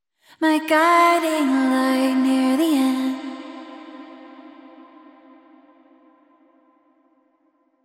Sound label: Vocals; Rev LX-24; Widen Your Pads